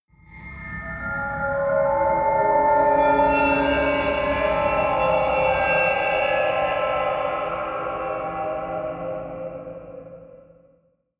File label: creepy stinger